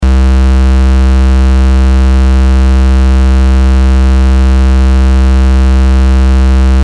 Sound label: vco puls wave, modular synth; Rechteck